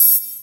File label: HH, Dance Drums; Best Service; Dance Mega Drums; HH HOU